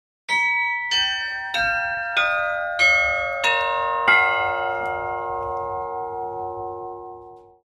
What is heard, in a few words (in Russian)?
7 kolokolov; Nyuv